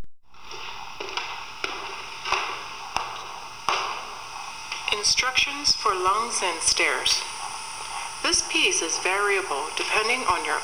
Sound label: lungs stairs